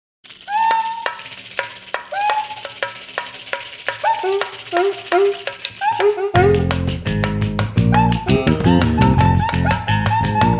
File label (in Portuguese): tamborim de prata pandeiro